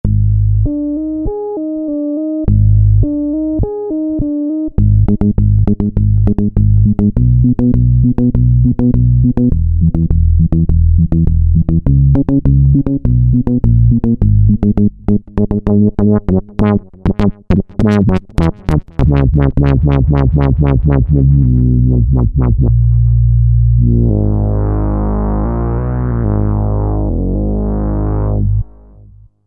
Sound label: Dark Bass, Roland V-Synth; Factory Patches